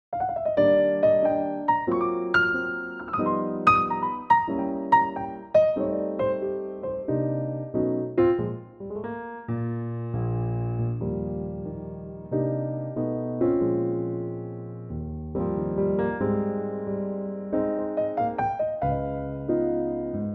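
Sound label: Eb piano, backing track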